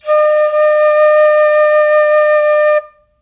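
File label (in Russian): fleita odinochnyi dolgii vozdushnyi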